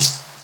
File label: HH, Dance Mega Drums; Best Service